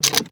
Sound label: gun check; soundscrate